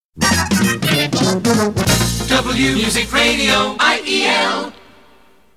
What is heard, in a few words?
jingle